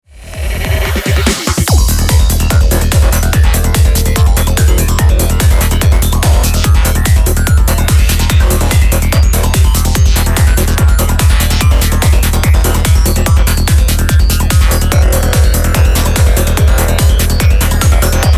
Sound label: hippie shaker